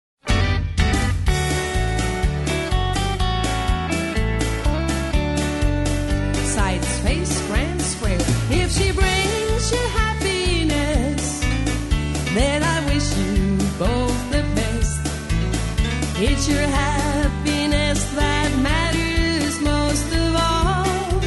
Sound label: vocal, square dance